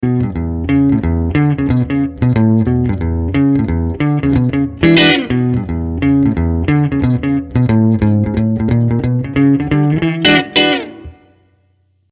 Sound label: Ibanez ARX 300 Neck pickup